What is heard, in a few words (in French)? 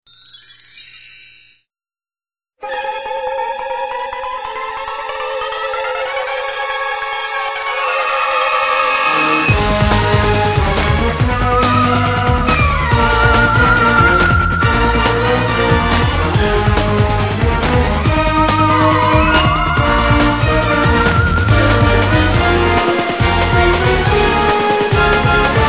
musique